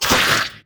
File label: Fiend Hurt